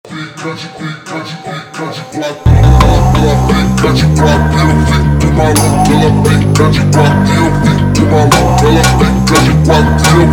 Funk slowed

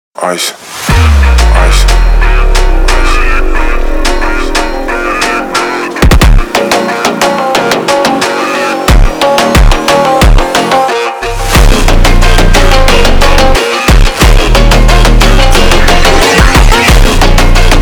funk slowed

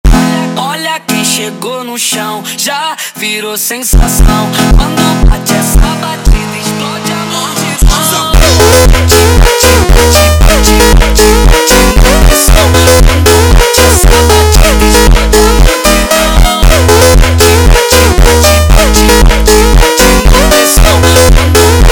slowed, فانک

